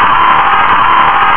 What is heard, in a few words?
alarm